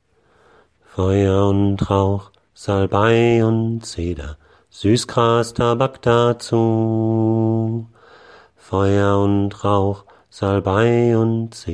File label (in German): Feuer und Rauch